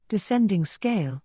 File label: descending scale